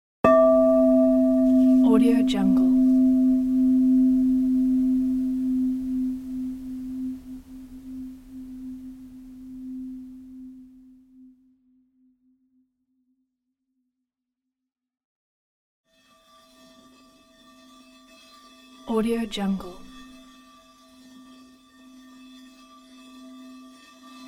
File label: meditation bell